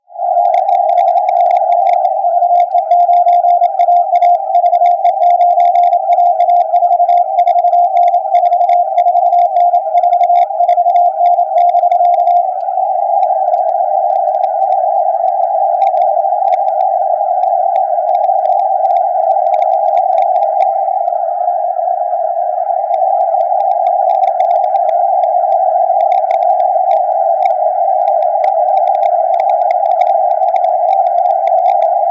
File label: 50-70MHz